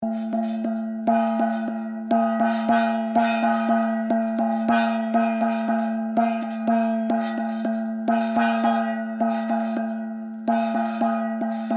japan gong

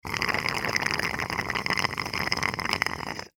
straw

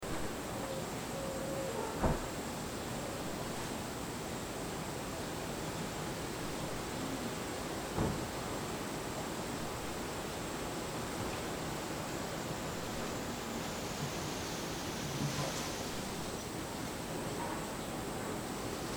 room ambient

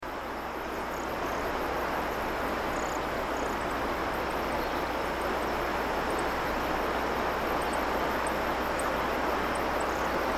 river slow

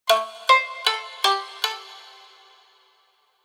shamisen

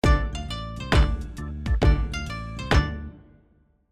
JINGLE Japan

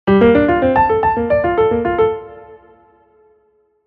logo piano

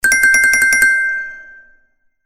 info bell

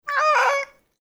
cat

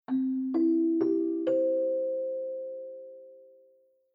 pinponpanpon

